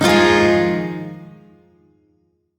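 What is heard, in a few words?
Shawzin Minor Chord; Warframe; Duviri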